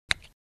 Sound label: click